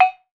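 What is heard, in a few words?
PANARUS, Metalophones, Pitched Percussion; E-MU Producer Series Vol. 7 – Old World Instruments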